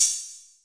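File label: 8000 hi-hat, Roland CR-8000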